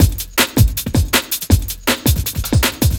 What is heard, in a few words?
Beat, Drum N Bass